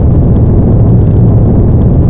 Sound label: rocket fly; weapons, rocket